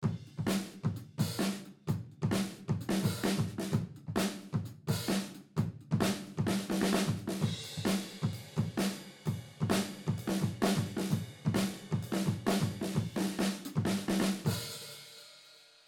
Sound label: drum ambi